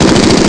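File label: MACHINE GUN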